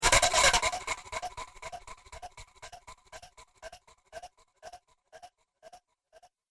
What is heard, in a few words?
Arcade Game Gritty Wind Up, retro; Video Game Sound Effects